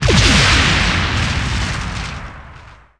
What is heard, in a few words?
hull break; federation